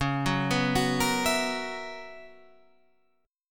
B Major 7th Suspended 2nd Suspended 4th Db, chord shape